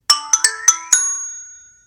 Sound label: lg k11 plus original message tune